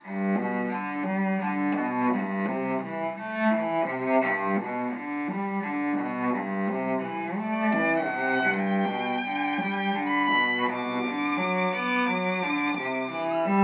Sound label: Vln Cello DUO